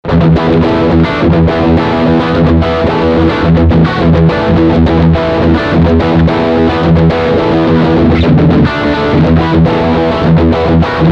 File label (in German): Riff Stegpickup